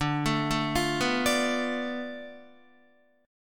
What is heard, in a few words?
D Minor 6th Guitar; Dm6 arpeggiated; JGuitar